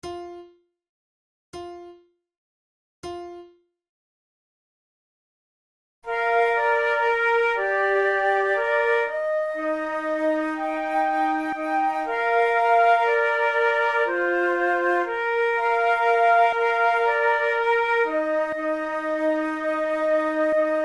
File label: binchois de plus en plus se renouvelle, flute